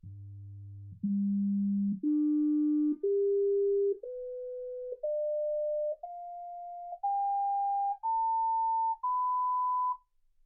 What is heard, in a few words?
sinus tones 48kHz